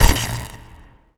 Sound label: discharge